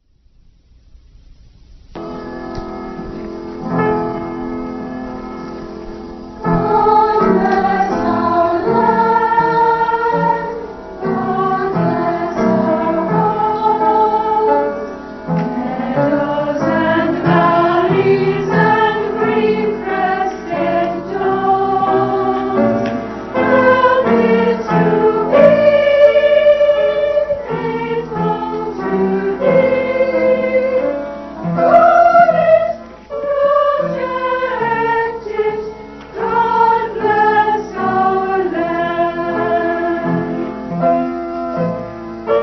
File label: bless land